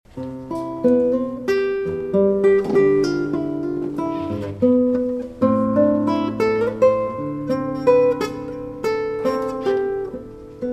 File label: Impromptu (reverb); Nuttall